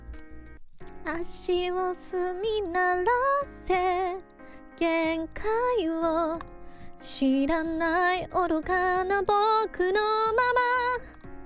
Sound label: Singing; IRyS